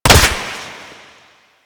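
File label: Flak Cannon Projectile Hit; Grineer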